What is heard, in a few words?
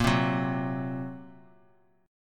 A sharp Suspended 2nd Sharp 5th A sharp down; strummed; JGuitar